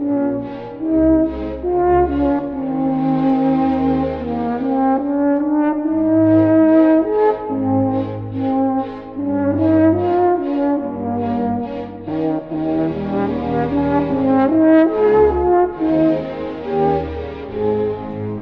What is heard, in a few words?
french horn; INSTRUMENTOS MUSICAIS